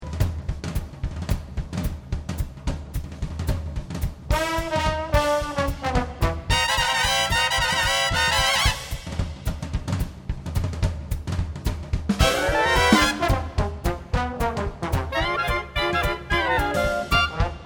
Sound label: Jazz